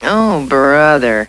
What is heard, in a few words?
DROWN; Wilma